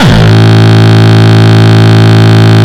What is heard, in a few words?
Longkick, kicks